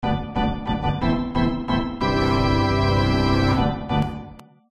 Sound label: Ditty; All Star Baseball 97 Featuring Frank Thomas; PS1; Saturn